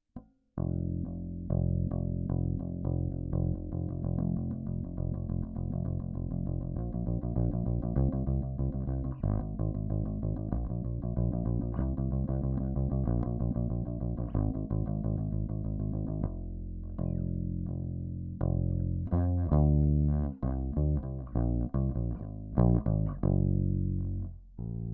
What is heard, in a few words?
dean bass big amp